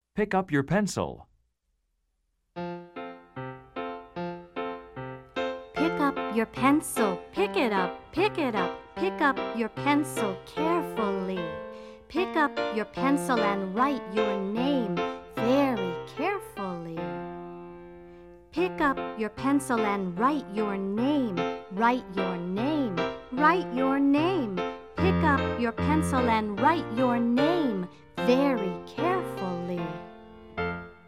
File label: Pick up Your Pencil (solo); Let's Chant, Let's Sing 1